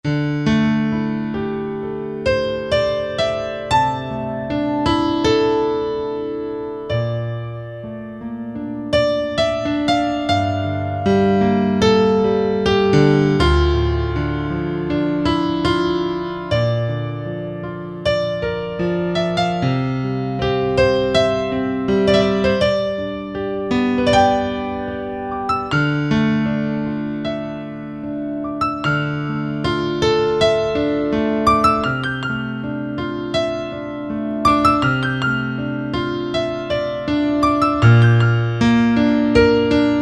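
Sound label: Piano Natural Grand, Yamaha EX5R; Factory presets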